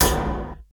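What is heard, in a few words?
DRUM HIT; Best Service Advanced Media Trax Building Blocks; FAT ROCKA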